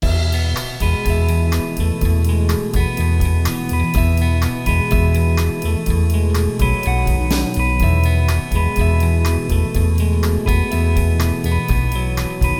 add ac. gtr, telecaster

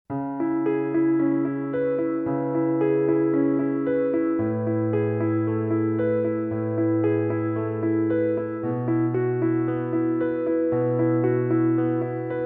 solo piano

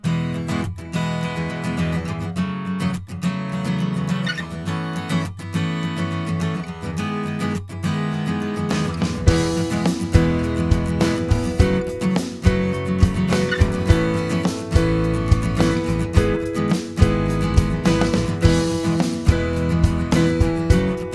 Strumming Power

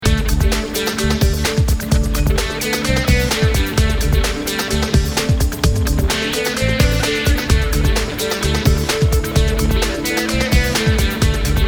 Kinetic Energy AltGtr

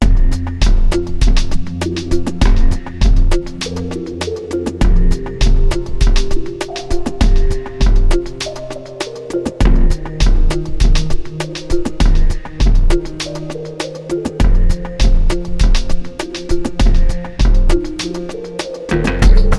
Generator Groove; modern science

